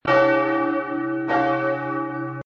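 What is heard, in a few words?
glocke des, bell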